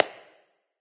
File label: clap; smite; olorun